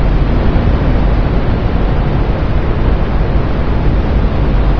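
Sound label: ambience machine; tank farm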